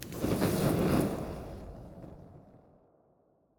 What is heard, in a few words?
intro charred forest; lol